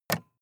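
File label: sports car interior switch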